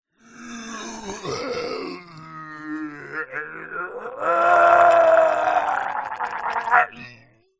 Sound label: horror zombie male groan